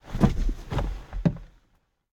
foley hit; driver; Blind Drive

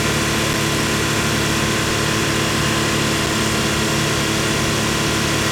Porsche 911T Loop; Automobiles

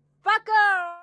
car reaction; Blind Drive

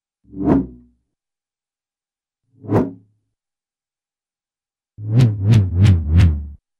Low-pitched Swish; Cartoon; Hollywood Edge; Cartoon Trax

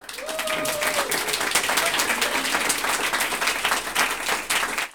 applause yells; best service Blue Box

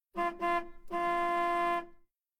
Carhorn; KMP Film Video Sound Effects

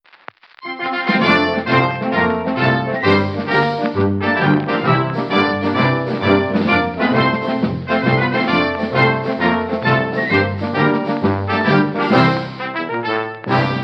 Vintage News Long, Jingles

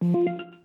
music off; Apotheorasis

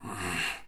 breath heavy in; Apotheorasis

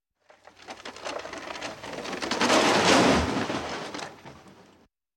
Walking Machinery Trucks

